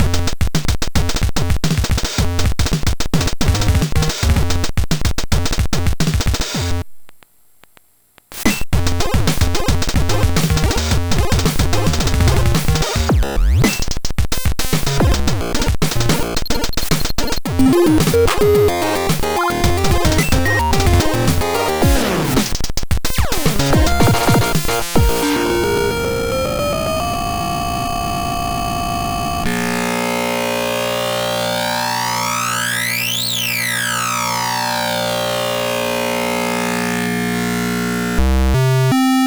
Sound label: sndtest gbp; gbsoundcomparison